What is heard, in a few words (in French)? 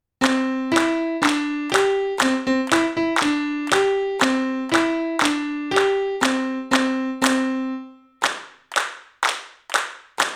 frappe frappe playback